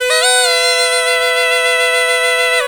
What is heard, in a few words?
PUNGI; USB Soundscan vol.45 - Indian Traditions; AKAI